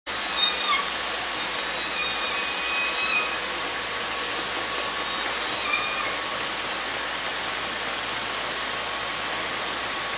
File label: clicks